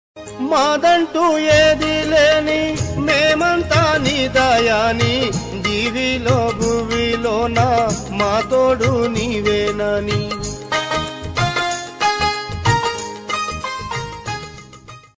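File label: bhakti background music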